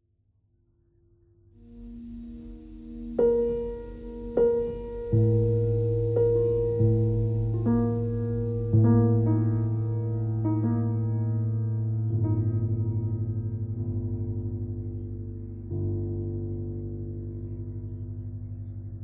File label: piano noise